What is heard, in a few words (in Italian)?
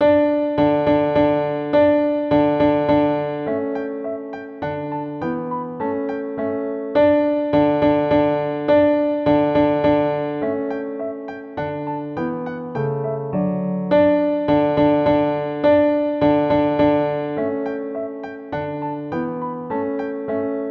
Danza in re piano